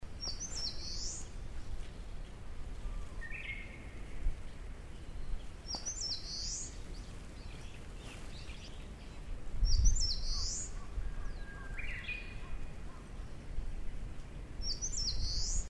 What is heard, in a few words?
Sendai musikui, eastern crowned warbler